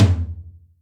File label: Room Lo, Acoustic Tom